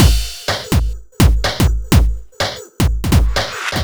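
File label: No percussion, Drum Loops 125bpm, Nu Dance Electro; Zero-G; Soundclash Nu Dance Electro; Acidized Wavs; Krakenhausen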